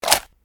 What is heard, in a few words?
draw colt; firefight